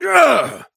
pain death

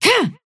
effort dash

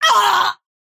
pain laser

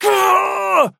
pain big; Trapper

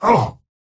pain small; Slork

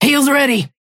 wolf ping heal ready

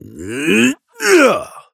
effort melee big; Operative

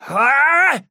effort melee big; Trapper

